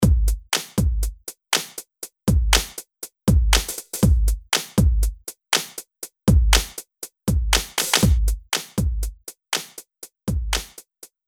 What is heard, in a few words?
morph filter